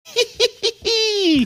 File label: heheheheeee